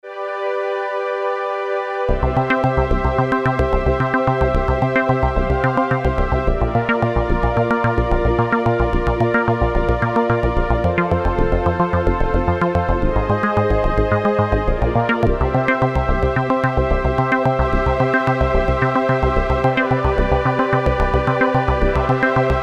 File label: Arpegg - Juno Pad, Roland JP-8000; Factory Performances